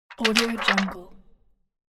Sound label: paper sheet flap